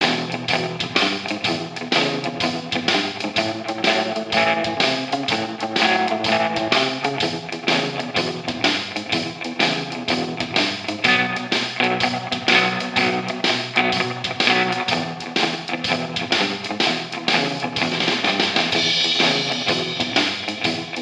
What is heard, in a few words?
Jukebox Rockabilly